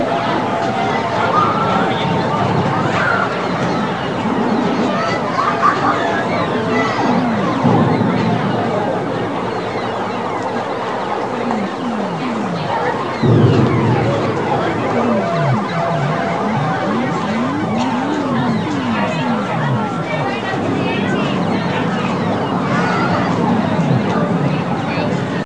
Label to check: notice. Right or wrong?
Wrong